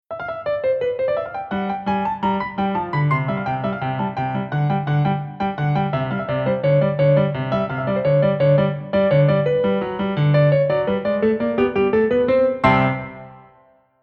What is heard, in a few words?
Scherzo In C Major